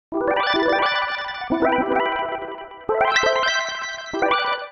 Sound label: Smooth Lead E Minor 90 bpm; MIDI Clips; Tonal; Melodic; Smooth It Out; Ableton; Factory Packs; Chop and Swing